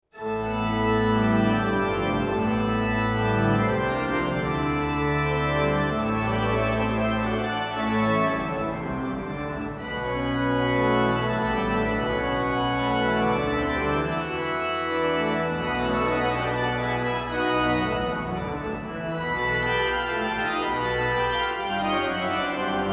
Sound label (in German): Choralbearbeitung, kirchenmusik; EG 99 Christ ist erstanden; Berthold Hummel; Ostern; Dekanatskantor Ingo Thrun; bad ems